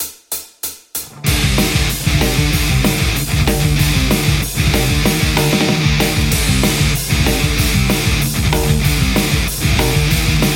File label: Les Paul; metal